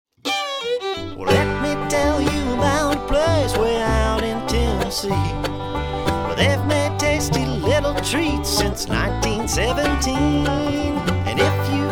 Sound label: MoonPie Jingle